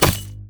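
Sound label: euphonium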